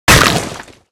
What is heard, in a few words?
Pillar Break